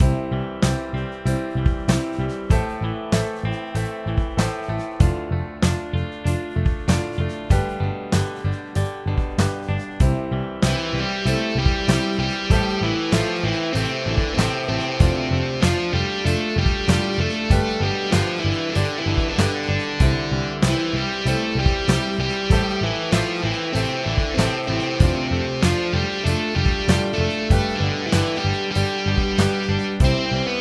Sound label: Doo Wop w Guitar